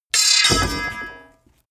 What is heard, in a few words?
sword hit drop